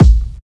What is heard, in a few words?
bass drum sound a key; kick drum samples